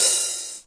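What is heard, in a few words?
Yamaha RS7000 hihat cymbal, Drum machines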